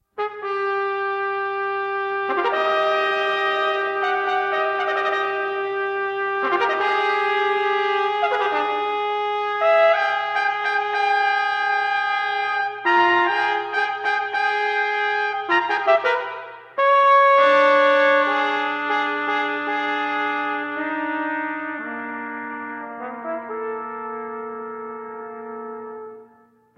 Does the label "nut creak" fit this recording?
No